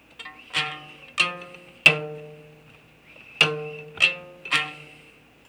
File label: guitar; Rainy Day Tissue Box Guitar